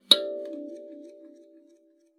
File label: KALIMBA D5; Xsample VOL-7